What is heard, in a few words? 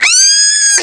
fear, scream